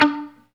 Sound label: GTR Mute; 90's Sample CDs; Club-50 - Foundations Roland; Funk & Mute; Chunk Mute